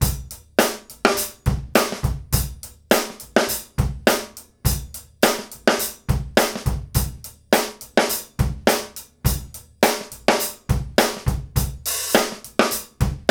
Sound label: GROOVE